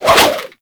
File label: Sword And Whip Spin Whoosh; Warframe; Weapons